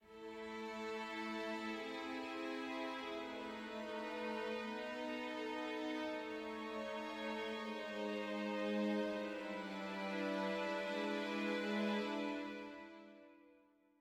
dark med start; Quivr; PS4 Windows gamerip 2016

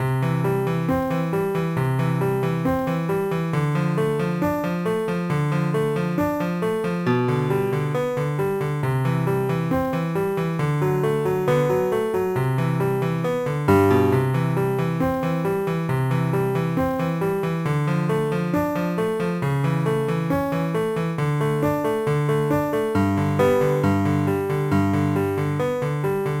BGM PIANO

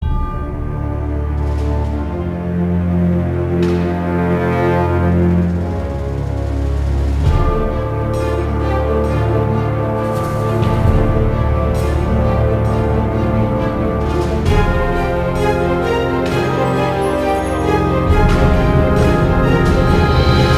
Algoad Sky Dock (Ambient); Eternal Legacy; Android

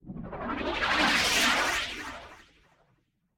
Dash; super mombo quest

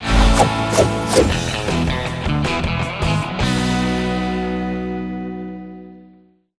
Scene Complete; zombiewood; Android

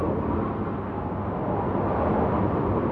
Ventil Wind

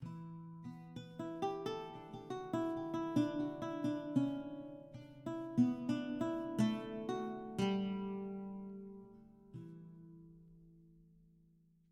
baroque guitar; Quivr; PS4 Windows gamerip 2016

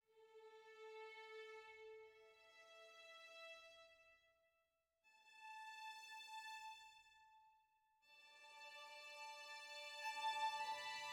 ambiant; Quivr; PS4 Windows gamerip 2016

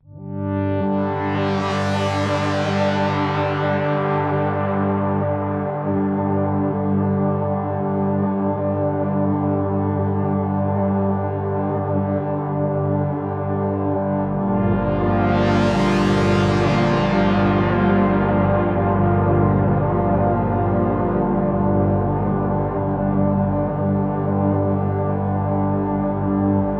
City Ruins Stem; Epitasis

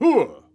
clown throw, clown dodger; Ringling Bros. and Barnum & Bailey; Wii

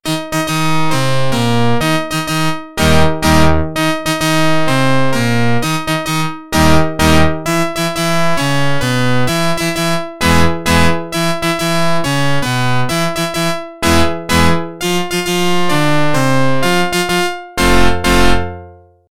Organ

